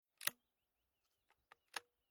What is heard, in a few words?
Sport Hunting Weapon Shotgun Ejecting Cartridges Close Barrel